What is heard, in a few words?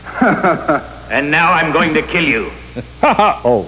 haha oh; MST3K